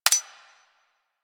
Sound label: Tommy Gun Mech; Weapons; Warframe; Tenno